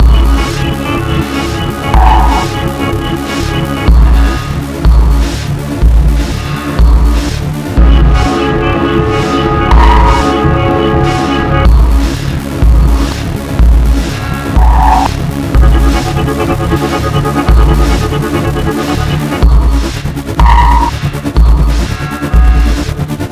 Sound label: Rave; Napalm; puss soundtrack